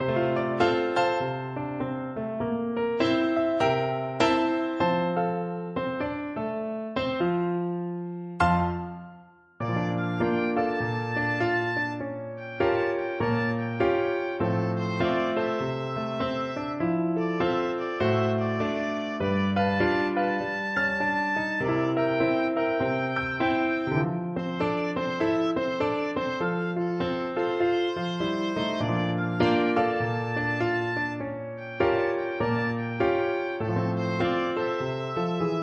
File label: oboe